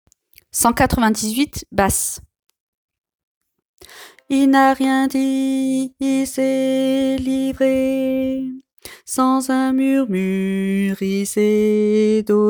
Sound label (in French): basse